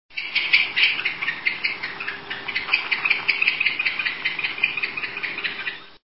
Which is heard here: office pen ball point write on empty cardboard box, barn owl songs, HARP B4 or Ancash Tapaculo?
barn owl songs